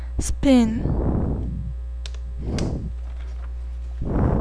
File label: spin